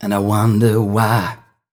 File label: m 128 mel b-flat, Vocals